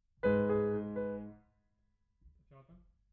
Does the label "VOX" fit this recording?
No